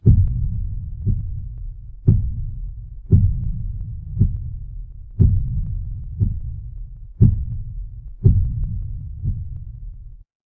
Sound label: horror heartbeat